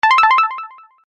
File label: UI Rollover Major, User interface feedback sounds